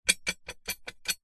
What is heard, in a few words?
industrial screw in metal fixing turn by hand finger flick